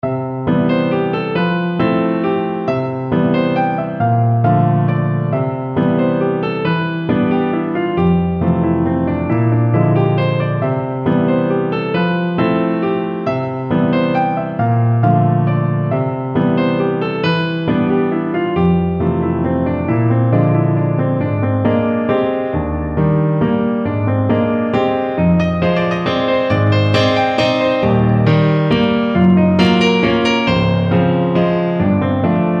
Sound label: waltz